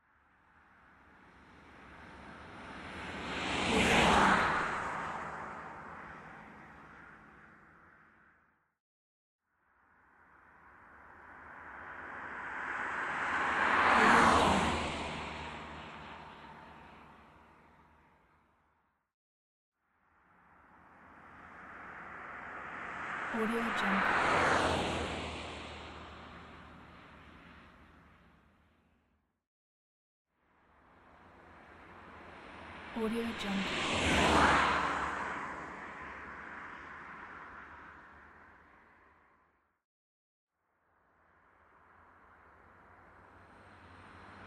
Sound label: moving car